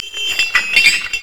brazier chains

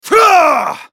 spellcast effort; Yasuo; en US

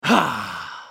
effort; Vladimir; Character Voices - English; en US

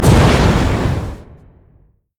summonerspell base exhaust; LoL

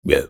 attack; Armordillo

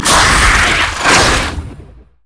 spider vortex; LoL

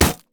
shen basicattack; LoL

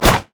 basic attacks; Ziggs; poolparty

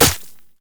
basic attacks; Zyra

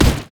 golem basic attack, monsters; LoL

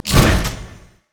headshot; LoL; caitlyn

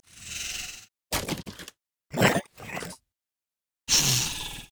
lizard elder bored; LoL; monsters

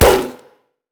tiger attack roar; LoL; udyr

